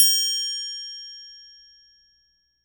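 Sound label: Triangle Open; Roland SPD-S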